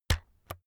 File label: keyboard external key hit slow